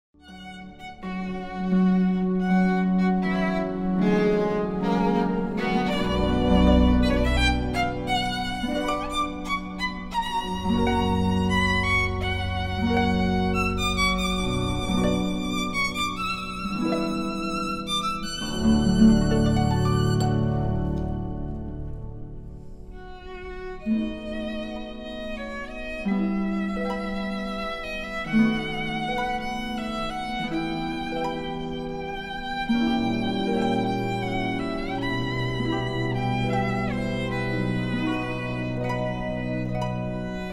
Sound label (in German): Violine Harfe Orgel